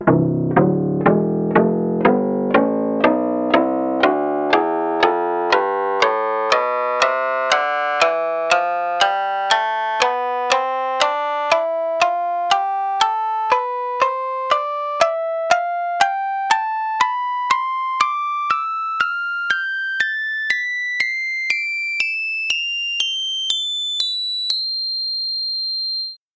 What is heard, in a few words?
shamisen tonleiter